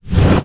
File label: Swoop